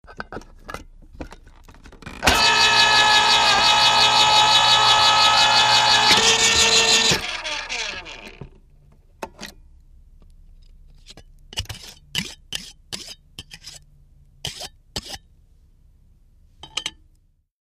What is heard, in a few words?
Can Opener Opn Can Scr, appliances